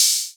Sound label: Open Hat (Clear), Analog Drum Kit; Cymatics - ANALOG EVOLUTION Beta